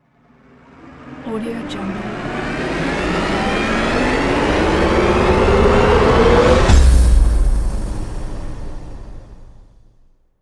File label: tense rising thriller; anderington